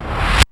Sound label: REV KIK; Hollywood Edge; The Big Bad Beatmachine; XR-10